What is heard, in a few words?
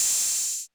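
Open Hi-Hat 808 Saturated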